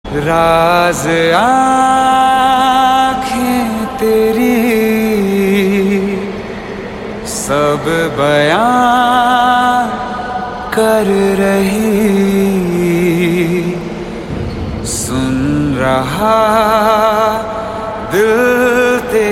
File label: Hindi Ringtone